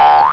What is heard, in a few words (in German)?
sprung